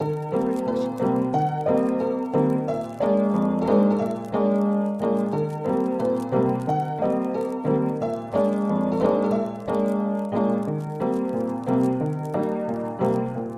happy hour, bpm 90; soundscrate

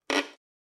Violin Scratchy Noises Short

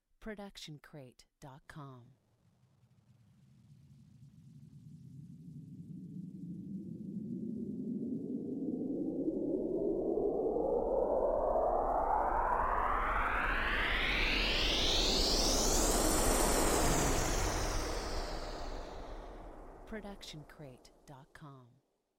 Cinematic Riser

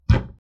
wood door bang outside

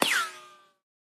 bullet impact ricochet

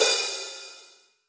hi hat 14 inch open; soundscrate

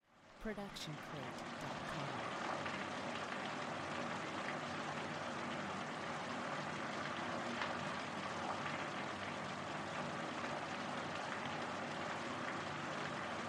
Rain Pouring Indoors; soundscrate

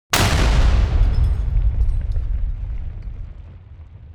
Impact Explosion; soundscrate

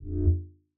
mid space ship short fly by; soundscrate

